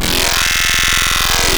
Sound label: High Vox; Wavetables; Synthetic's Serum Collection; Cymatics - Essentials Wavetables